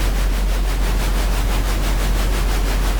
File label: tram rumble